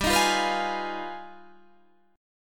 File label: G Augmented 7th Flat 9th